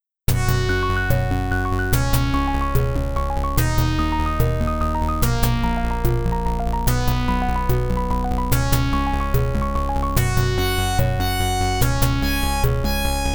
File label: Pachelbel's Canon, IBM-PC AdLib; lemmings